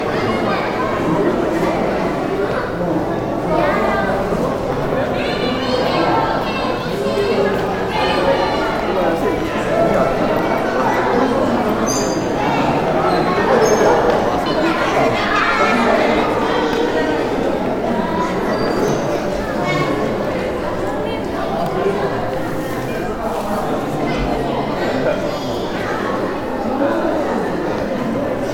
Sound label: festival in a hall